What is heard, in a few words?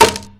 wood box impact bullet; physics